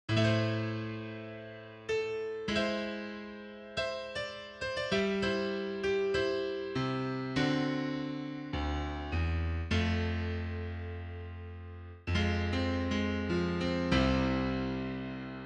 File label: Piano